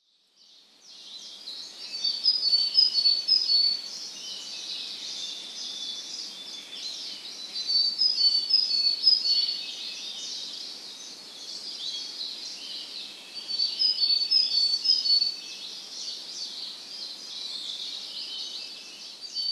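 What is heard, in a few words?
Willow Tit; koe; kogara; nikko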